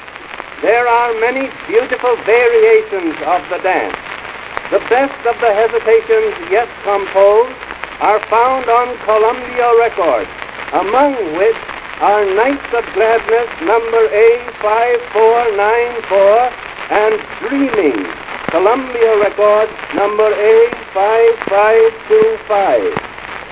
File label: Waltz